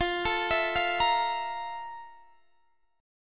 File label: F Major 11th Guitar Half Step Down